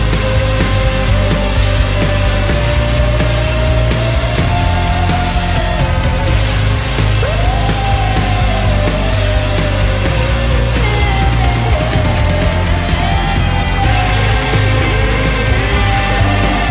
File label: death metal